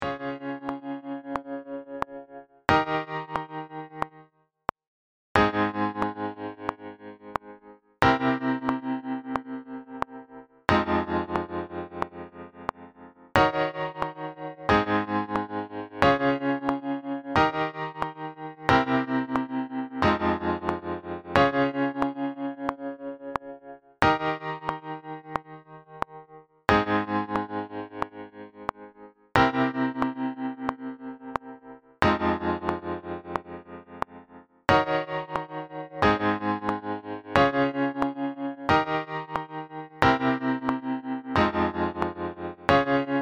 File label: LFO